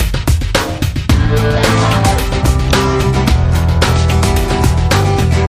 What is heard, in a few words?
Acoustica - Stinger